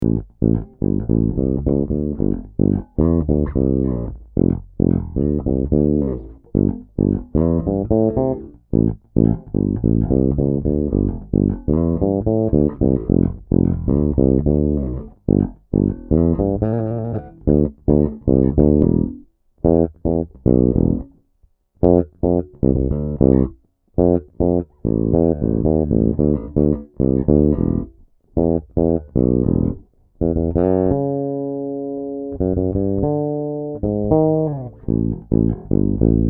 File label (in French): Did C Actif Tone Off, basses